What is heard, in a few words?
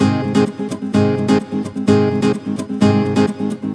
Nylon Guitar Kit, G# maj, 128BPM; Vengeance Samples; Special Sounds